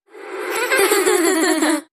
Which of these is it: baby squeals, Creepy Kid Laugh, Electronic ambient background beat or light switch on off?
Creepy Kid Laugh